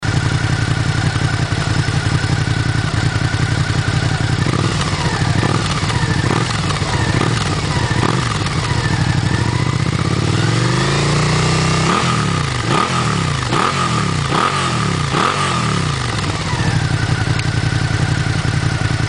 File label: Suzuki Gladius